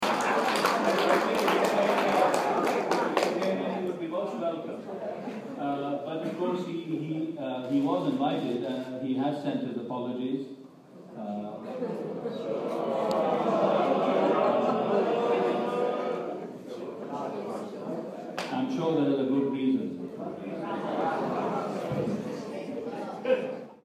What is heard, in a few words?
rochester crowd boo; absent mark reckless